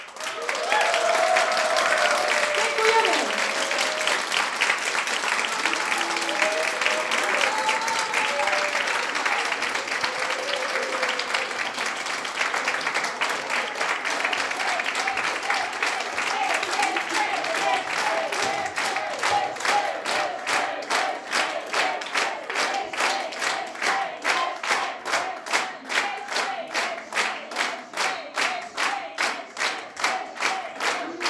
Applause

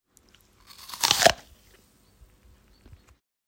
Biting An Apple

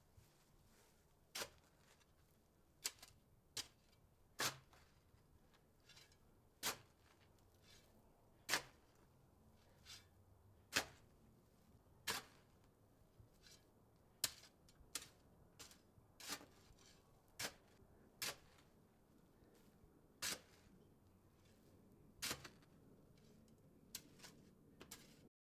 Digging Into Mud

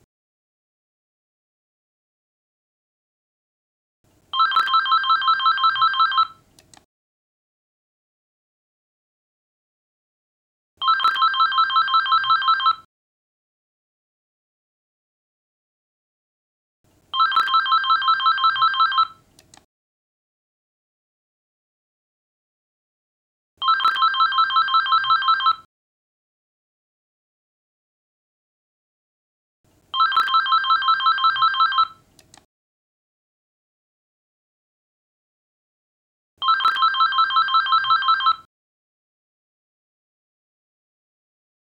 Ringing

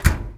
Close

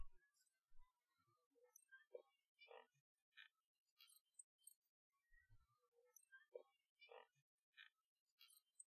Swinging Swing Set

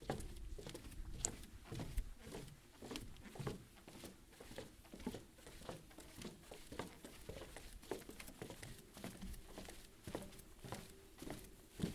Walking Footsteps Wodden Bridge

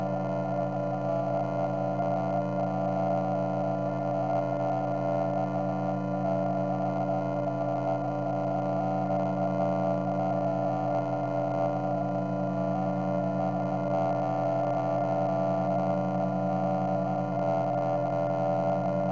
Creepy Tech Ambient

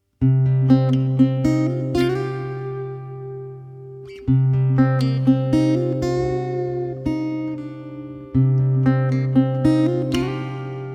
Acoustic Guitar Melody Fingerstyle

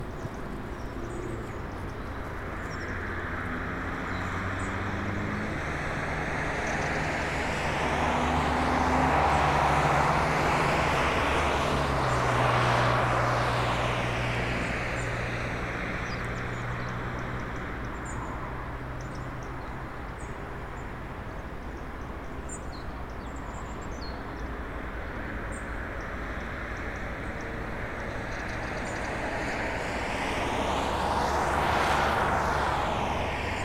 Long Passing Cars Ambience

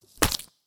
Short Crunchy Splat

